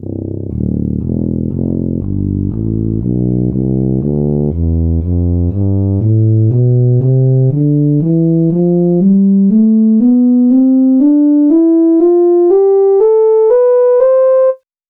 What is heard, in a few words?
tuba tonleiter